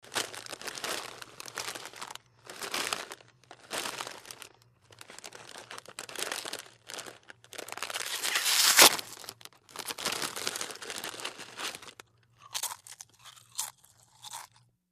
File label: Potato chips, small bag, rustle, squeaky rip open, munch